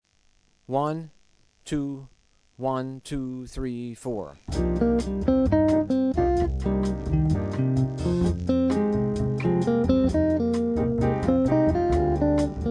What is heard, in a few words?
Penta Improv